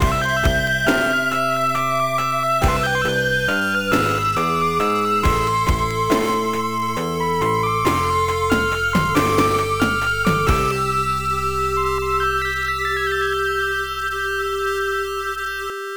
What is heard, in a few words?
Victory; Golden Axe III; Genesis